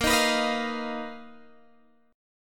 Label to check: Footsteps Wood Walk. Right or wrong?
Wrong